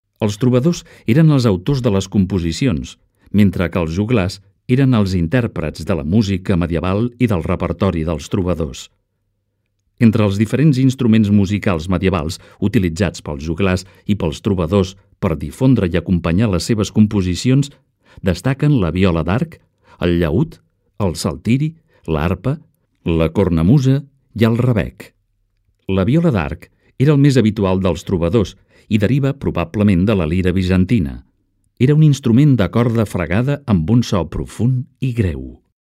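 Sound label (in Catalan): Instruments de música medieval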